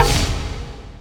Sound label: cask bounce; witch doctor